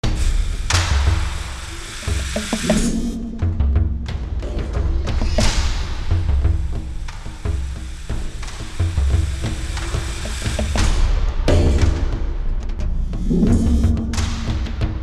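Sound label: Battle Loop Rural Percussion; Fallout New Vegas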